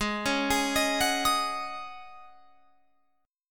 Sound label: G sharp 7th Suspended 4th G sharp, Guitar Open F; G#7sus4; 20,20,20,22,21,22; arpeggiated